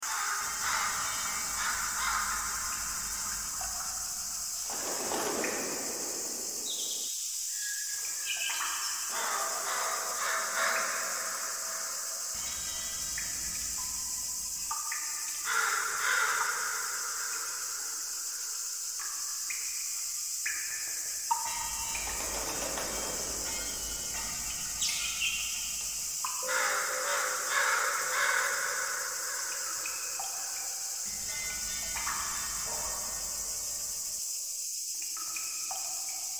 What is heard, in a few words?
ambience overgrowth; portal 2